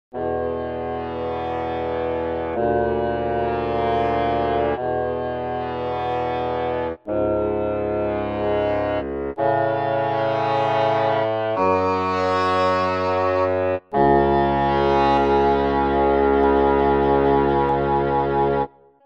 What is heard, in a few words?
Cor Anglais English Horn; Woodwinds; DAL Flute; Syntheway